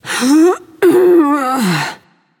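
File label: grunt lift, female; smite; voicepack; hera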